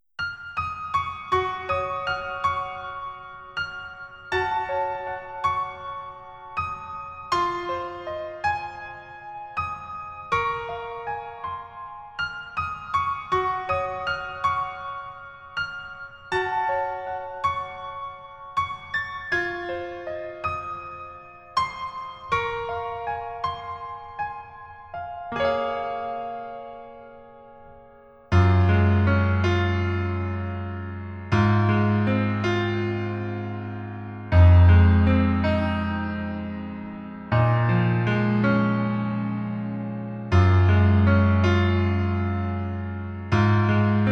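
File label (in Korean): Piano version -1키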